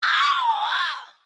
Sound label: notification